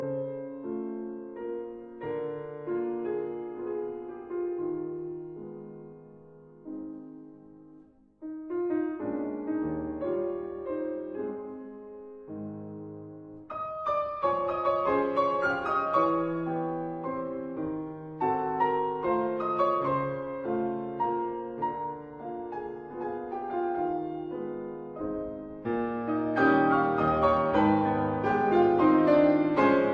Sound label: Waltz in A flat major; Chopin; Op 69 No 1